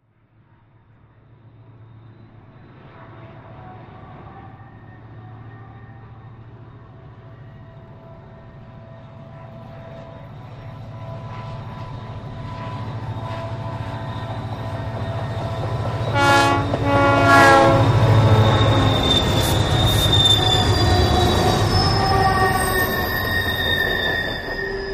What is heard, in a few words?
Train Horn Doppler